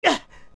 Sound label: Hurt; Adult Link; Link getting hurt